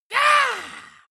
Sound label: monster growl attack high; schreie